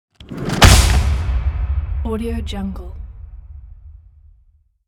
trailer hit

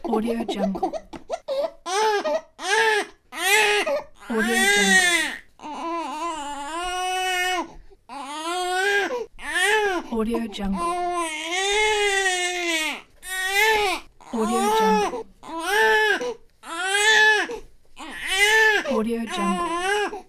newborn baby crying

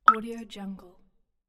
drop; crisstyna

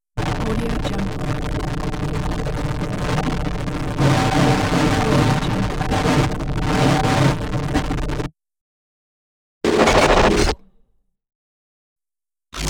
glitch pack; jw-production

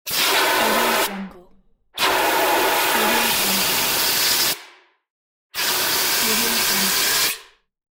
fire extinguisher

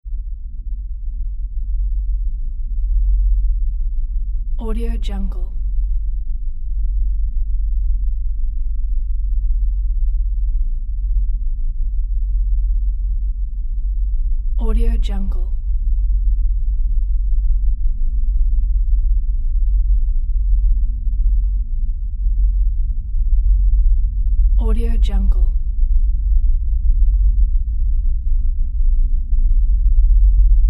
deep rumble; mountainaudio

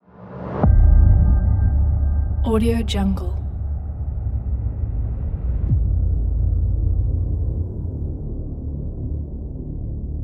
dark suspense

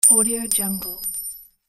coins; fainstudio

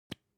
object hygiene lipstick open soft

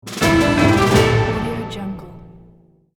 dramatic orchestral breaking news jingle; tony-parsons-music

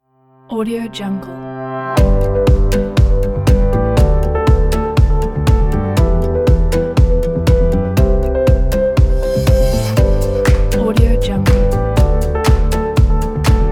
happy and uplifting; brillianceaudio

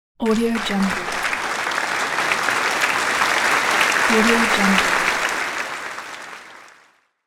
applause

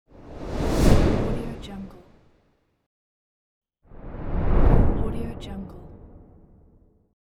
whoosh flyby

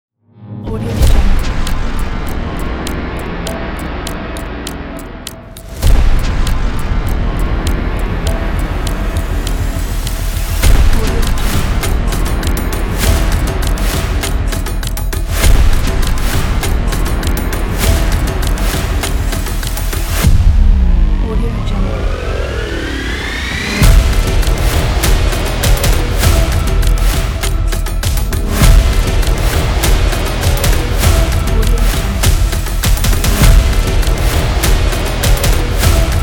tense countdown trailer action; maslorimusic

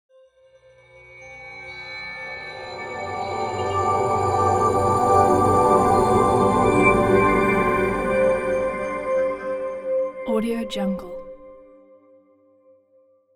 light logo; magicsoundflower

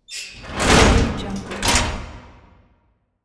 prison door

